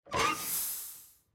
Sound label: Pipe Flip